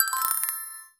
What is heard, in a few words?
ui crafting menu opening